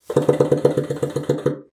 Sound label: BASSOON; Xsample VOL-6